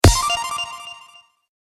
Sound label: confirm Menu; fnfmidfight